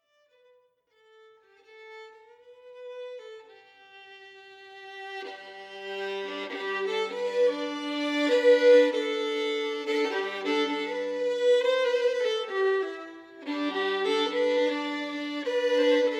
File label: Waltz